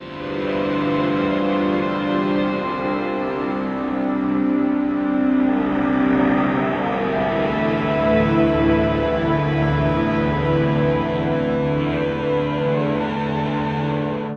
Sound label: thoughtful distorted guitars pad; LOOPING AMBIENCE